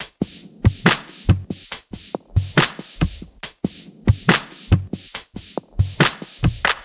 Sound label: SloMo Beats, Loops 140 BPM